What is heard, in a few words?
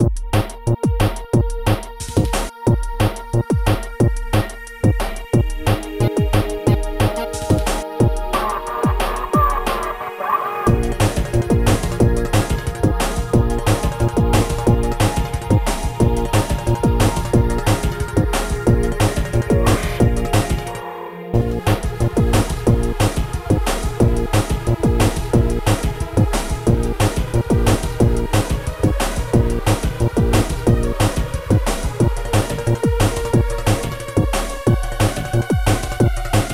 Loop; Geometry Wars Galaxies; Wii